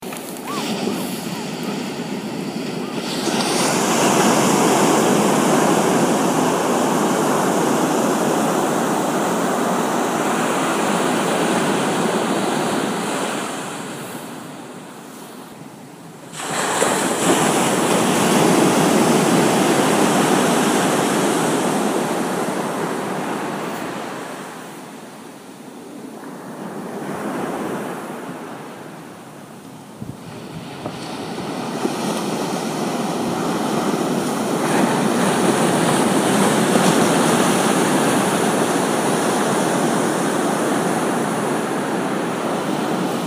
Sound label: Sleepwell With Crashing Waves